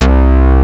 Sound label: PLUK, ENSONIQ ESQ-1; Rubber Chicken Software Sample Bank